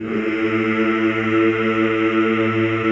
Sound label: CHO Yhh, Roland; Sound & Vision - Gigapack I CD 2 (Roland)